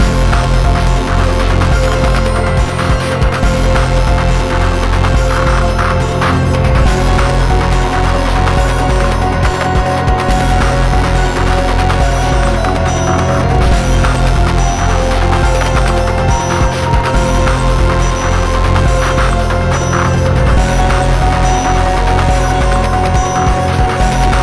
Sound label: Glitch sonata Medium